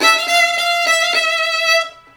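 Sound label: VIOLIN LOOP, Asian Traditions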